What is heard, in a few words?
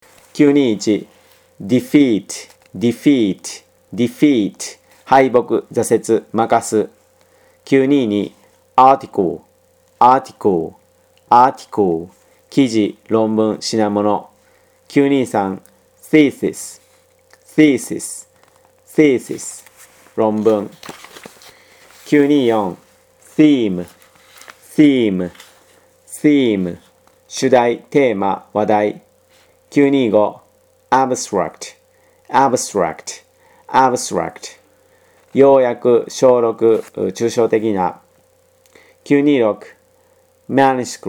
tango